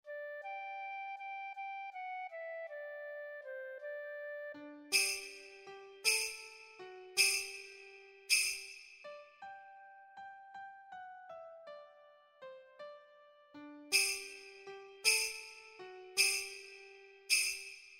PERC, vals

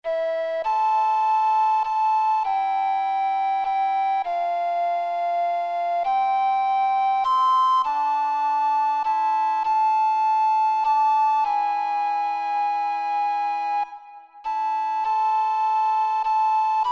flautas diversas 2VOZ

